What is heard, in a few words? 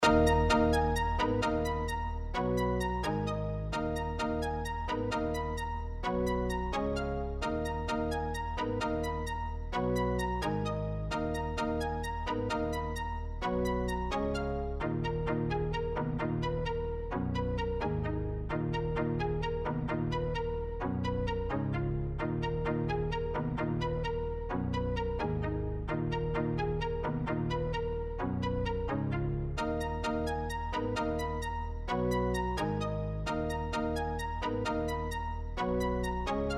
D min 130 BPM Synth